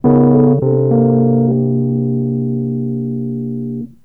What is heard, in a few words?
Rhodes, Keyboards; Total Drum&Bass